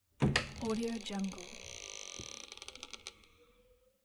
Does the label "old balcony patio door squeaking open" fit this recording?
Yes